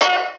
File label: cowbell; cheesy-themepark; dontWhackThePack